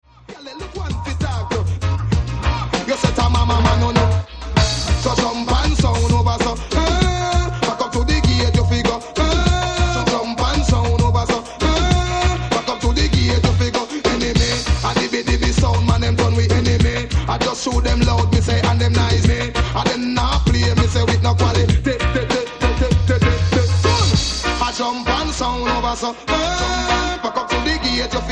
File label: Drum pan sound